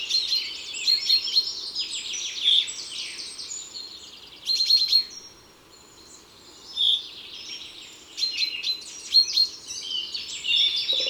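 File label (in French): Pinson des arbres cris; Drome; Concerts Naturels de la Drome; Studio les Trois Becs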